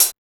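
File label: hi-hat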